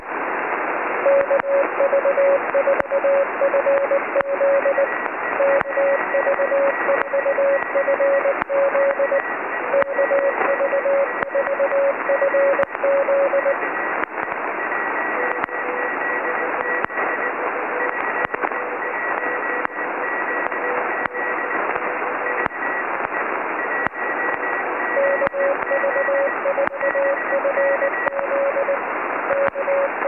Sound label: Impulse Right